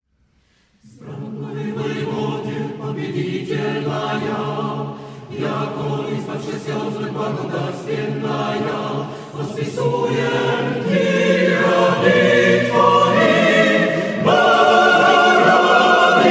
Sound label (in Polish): Chór Kameralny; Wzbrannoj wojewodie; Roman Ledieniow; Sopocki; Continuo; Sopot (Polska)